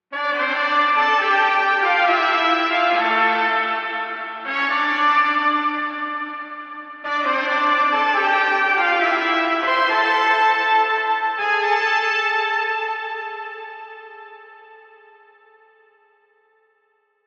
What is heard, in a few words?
Trumpet no MOD